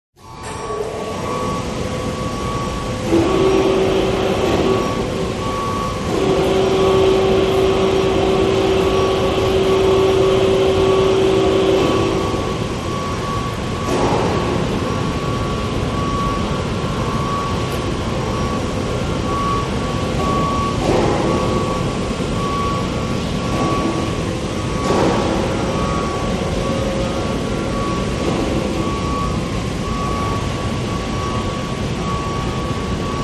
Warehouse Background 2; Industrial Ambience; Fan / Motor Noises; Constant Backup Beep, Various Forklifts Lifting, Medium Perspective